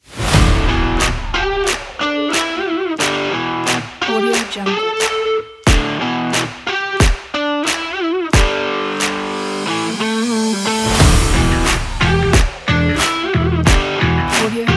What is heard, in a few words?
that positive